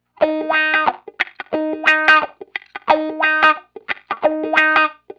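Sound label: 090 Acid Jazz Wah Rhythm Guitar F major; Pro Tools Essential Loops; Digidesign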